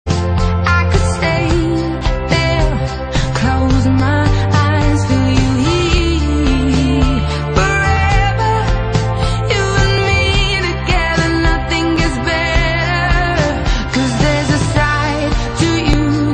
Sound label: ringtone; iphone 4s